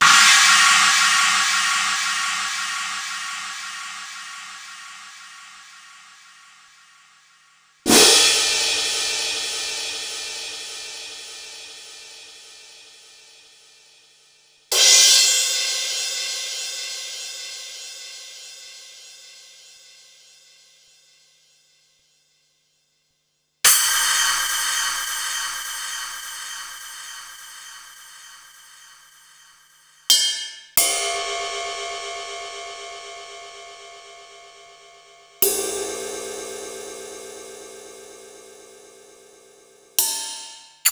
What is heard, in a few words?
Korg 05R-W Korg Cymbals